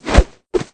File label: punch up; rocco